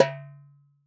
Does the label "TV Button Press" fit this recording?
No